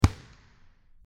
Basketball dribble; Sound FX

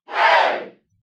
crowd ambience

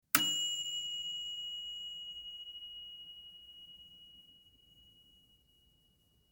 Oven timer ding

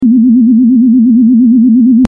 Spaceship

